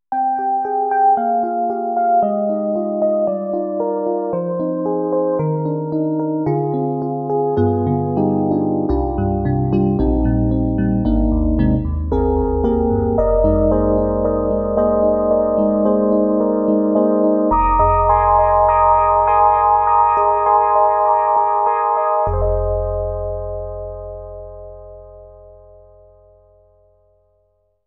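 SD-90 Enhanced Chorus Electric Piano Wah FM Electric Piano; EDIROL; Wah FM EP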